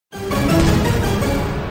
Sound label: sound result lose